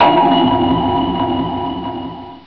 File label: ping